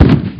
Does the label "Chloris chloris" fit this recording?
No